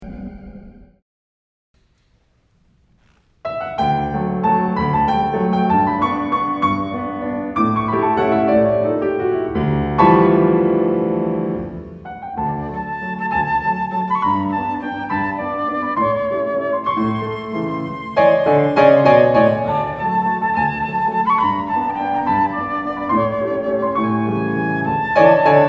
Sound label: waltz